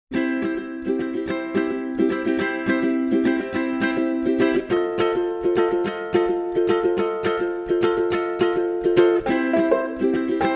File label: Ukulele Vs. Kazoo and Whistle Too